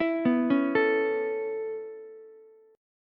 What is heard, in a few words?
B 7th Suspended 2nd Suspended 4th down Ukulele, chord; B7sus2sus4; arpeggiated; JGuitar